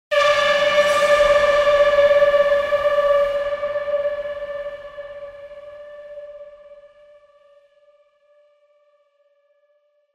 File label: Trailer Tools Alarm; Production Music; CATastrophic